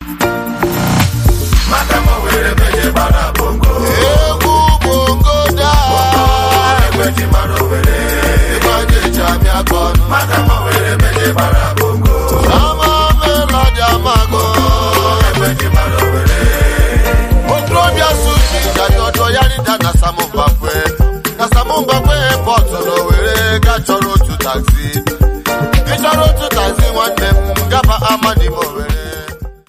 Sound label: Egwu Bongo